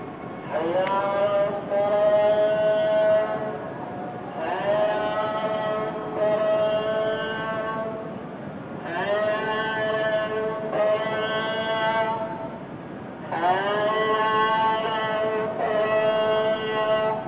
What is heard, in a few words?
pray call